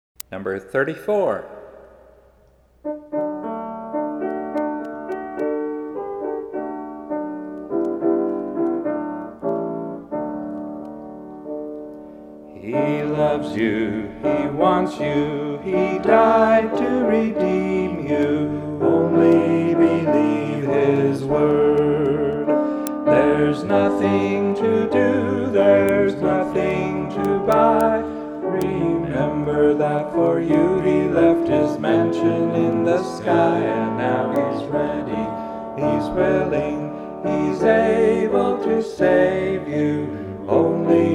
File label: Hymn